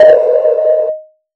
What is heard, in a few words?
Hats, Alesis Performance-Pad